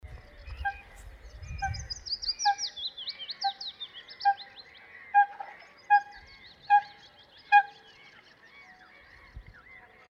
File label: coot